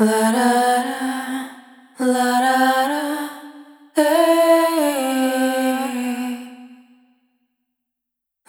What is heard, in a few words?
Multi-Voice Loops; Sonic Foundry (Sony Creative Software) - Pocket Diva World Class Vocal Samples